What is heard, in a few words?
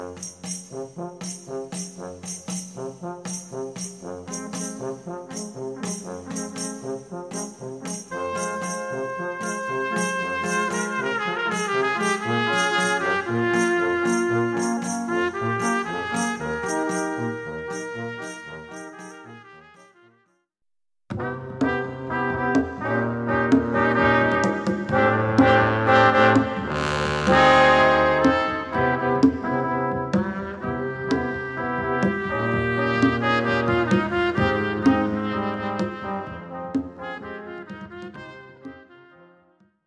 rumba bossa nova